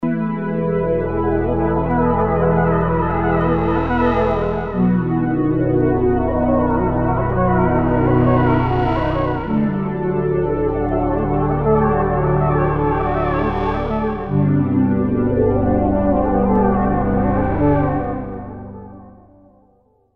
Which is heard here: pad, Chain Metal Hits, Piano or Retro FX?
pad